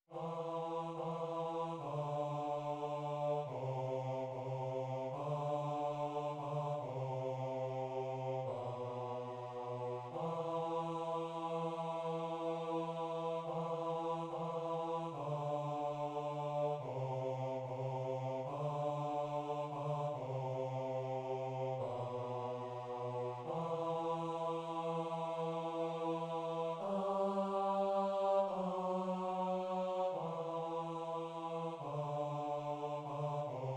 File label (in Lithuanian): BASS; Viešpatie pasigailėk; Šv Augustino mišios